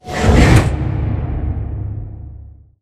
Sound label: pod door lg verb close slide; Titanfall 2